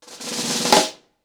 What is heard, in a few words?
Snare FX 90BPM, ACOUSTIC DRUMS; Sonokinetic - The Roots Drumloops